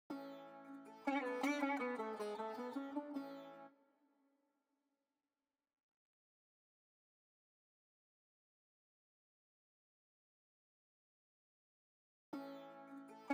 157 BPM F# Min Strings; Melodies; Loop Stems; Cymatics; Pharaoh Premium Drum Samples (BETA); Valley of the Kings

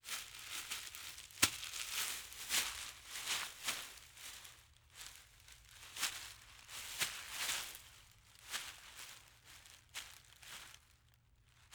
Grass Hay; Fairlight Sound Lib

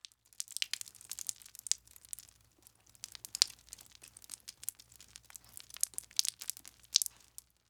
Water Drips Drops; Fairlight Sound Lib

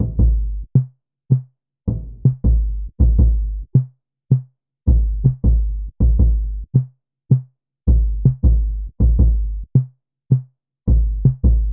80 BPM D Maj Percussion; RnB; Loop Stems; Cymatics; To You; 2022 Melody Collection; RnB Pt 2

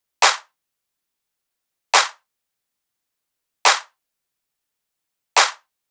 Riddim Drum Loop Clap (140); Drum Loops; Ghosthack; Riddim Essentials 2020

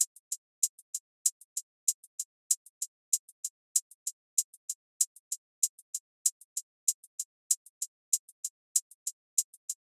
Drum Loop, 96 BPM Hihat; Cymatics; Vibes II Drum Loop Collection; Cavern Drum Loop

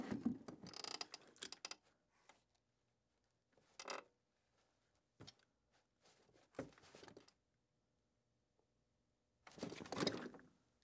Wood Chair Squeak Metal; Fairlight Sound Lib

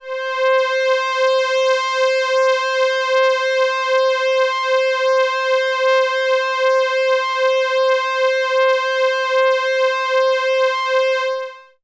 Oberheim OB-8 PAD Memory High - C; Synth; One Shots; Cymatics; Fantasy Synth Sample Pack

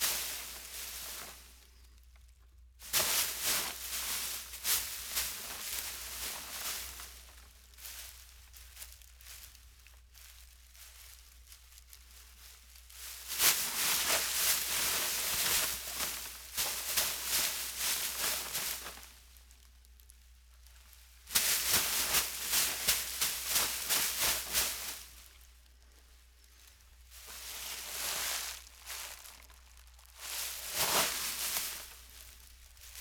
Hay Movement, Scuffling, Misc

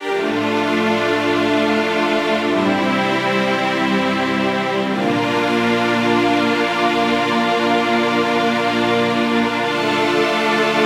String Section Harmony - Dm 100Bpm; Construction Kits; STEMS; Ghosthack; SkyRealm; Kit 26 - Homeward Bound

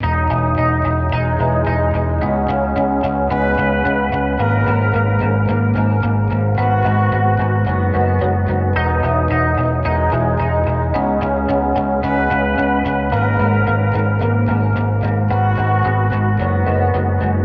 110 BPM G# Min, Hip Hop Melodies, Sad; Dead Inside

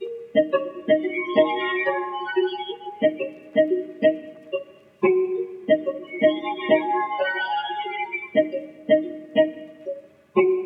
R&B Melody Loop - 90 BPM D# Min Guitar; Melodies; Loop Stems; Cymatics; Oracle Sample Pack

